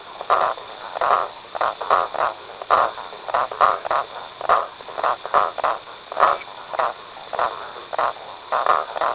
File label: swamp; The Elder Scrolls III Morrowind GOTY; XBOX